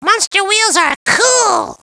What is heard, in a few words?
Monster Wheelz Pickup; Monster Wheelz are cool!; Gruntz